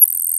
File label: Bird Sparrow